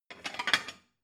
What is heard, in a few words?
plate cupboard take slide